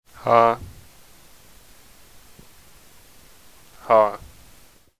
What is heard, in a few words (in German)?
lachen